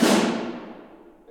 Snare

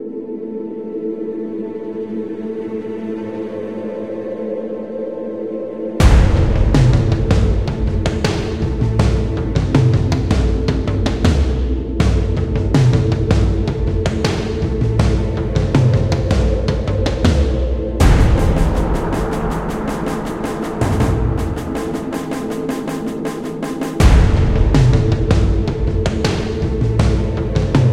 Action Ambient; Ceres

